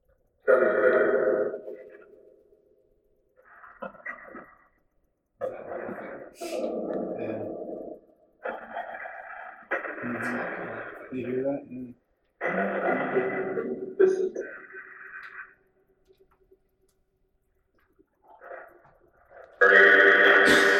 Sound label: Hallway Phasma Box